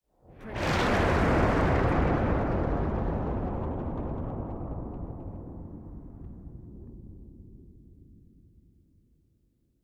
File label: Nuclear Explosion; SoundsCrate